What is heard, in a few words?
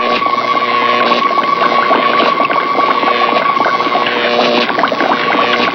Ancient Noise FX, Industrial